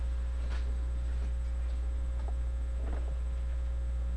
stab

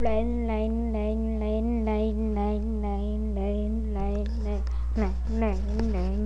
rain

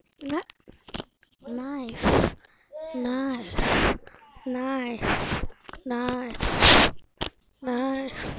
knife

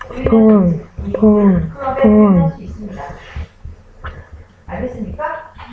phone

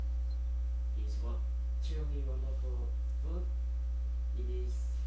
harp

